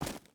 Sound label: footstep rubber boots gravel run; Rust